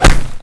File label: gun land impact